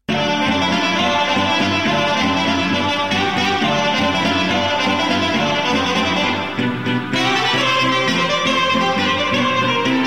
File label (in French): Briul - Roumanie